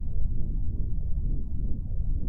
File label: background sound; eliasdb